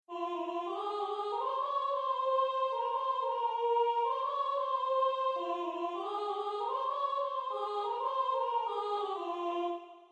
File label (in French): voix